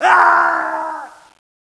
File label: body scream